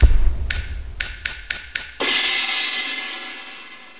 break, ambience; svencoop

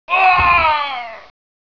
bandit die backwards; gearbox

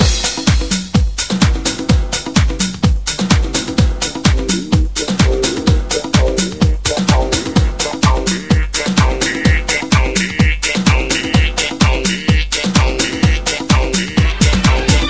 music

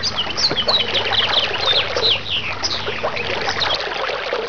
water and birds, ambience; dod

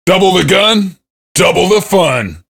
cheer; svencoop; voice commands; SeriousSam

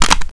rifle clip in; firearms

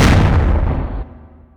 wrenched; svencoop

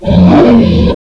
attack

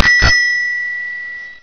double bell

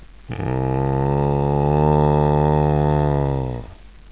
hummmmmmm, zombie; brainbread

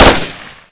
mp5 burst; firearms

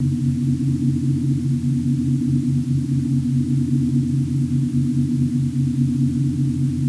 loop room; gearbox; klingon

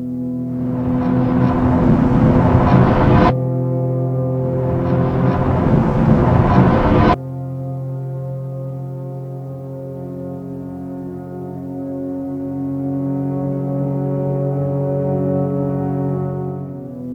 repeating loop; svencoop

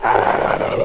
pit drone idle; svencoop

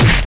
wrench hit

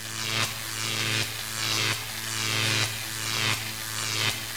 electric

